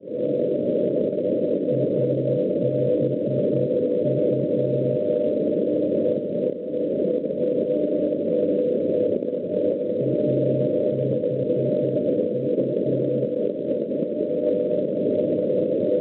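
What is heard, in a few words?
non-directional beacon 330 kHz; ABU; Altenburg; Schwarzsee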